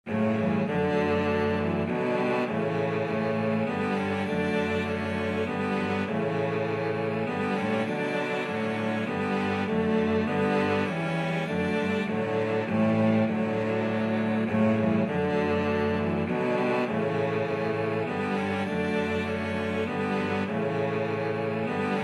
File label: cello quartet